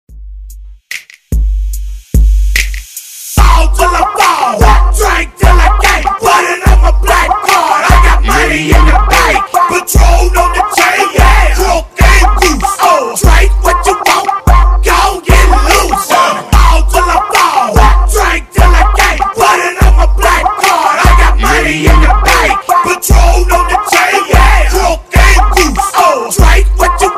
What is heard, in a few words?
Hype Redrum Dirty